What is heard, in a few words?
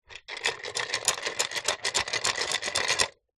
leisure chess game pieces shake in set box